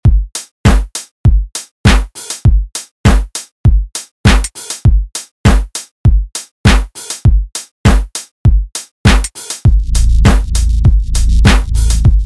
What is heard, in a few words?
kick modulated bass